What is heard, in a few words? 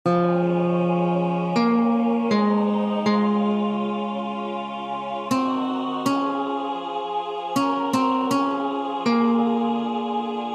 T